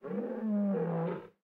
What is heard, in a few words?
animals lion; serengetikings